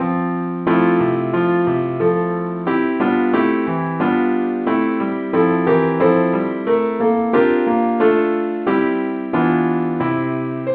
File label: keyboard only key F; SAINTTHOMAS